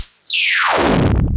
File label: Big combo over